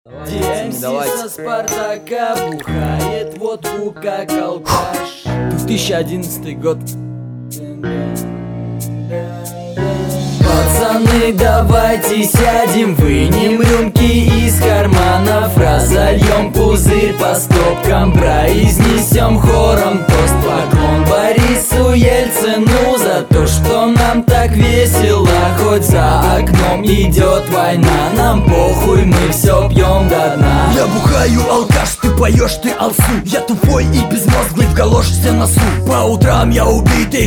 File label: BATTLE RAP instrumental